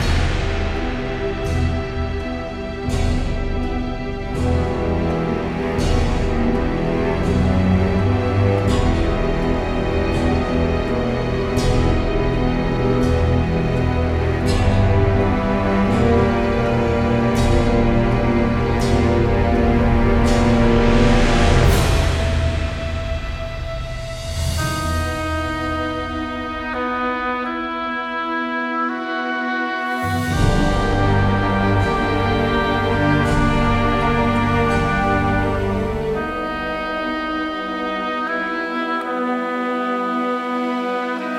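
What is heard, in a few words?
background music preparation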